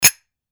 bell

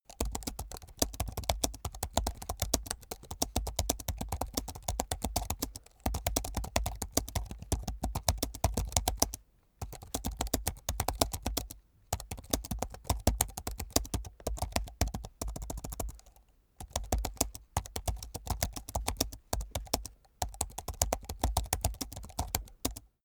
keyboard laptop type sequence hard

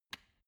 switch turn off soft

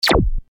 syntetic zap

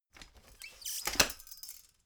folding chair fold fast

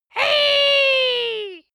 dwarf cheer "hey"

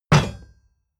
war hammer put down hard

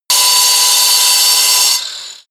grinder bright on load stop

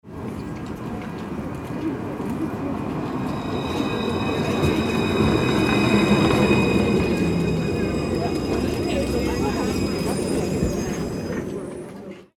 tram arrival

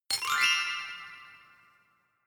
notification glockenspiel sparkle slide up

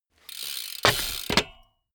bmx bike pedal grip freewheel

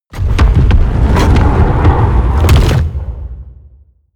mechanical lever stone large down

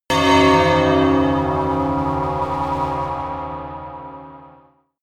melodic church bell pad low

